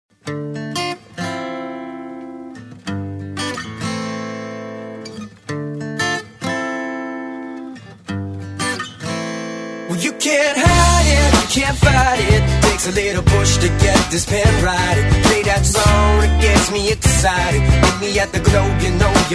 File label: rock